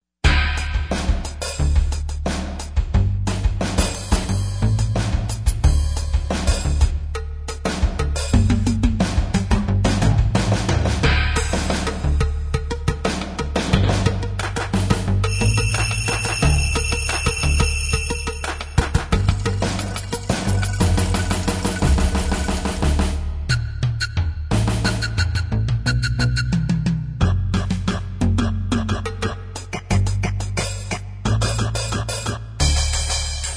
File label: Drum Set, Yamaha TG55; Factory Presets